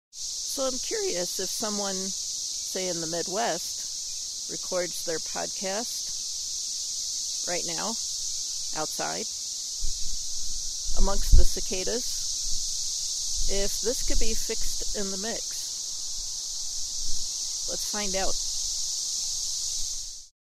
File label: Cicadas